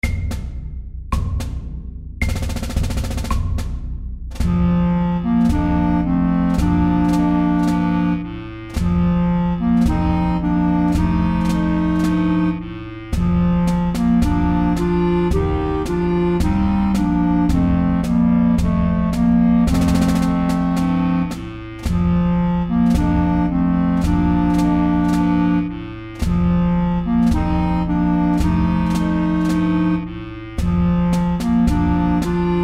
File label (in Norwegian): Juniormarsj, 110